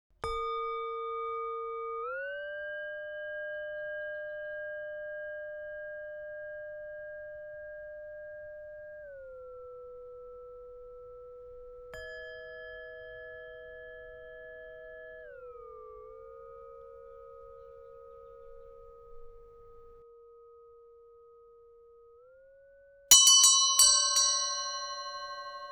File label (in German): gummiglocken